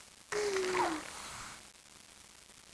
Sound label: fricative